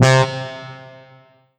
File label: Yamaha TG77 FM Bite Brass C3